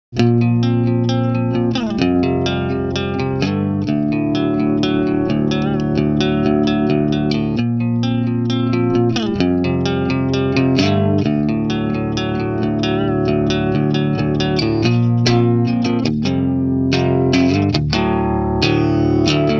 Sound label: Modern Rock with chorus